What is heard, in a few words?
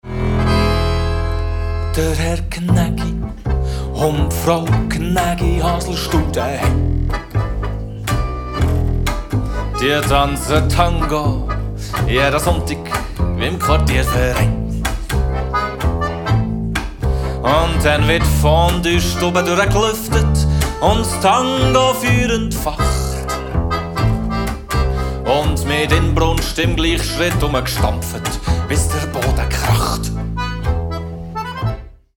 Tango